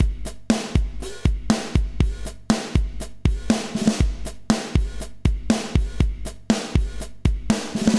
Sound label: emscripten beat mono; webaudio